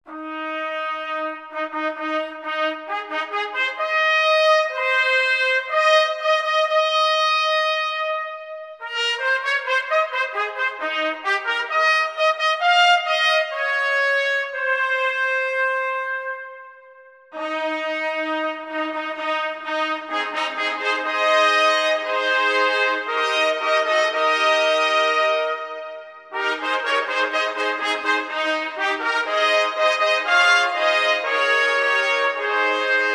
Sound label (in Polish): Hejnał Łącki